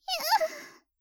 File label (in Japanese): ひゃううっ, 天然; ハイグレボイス集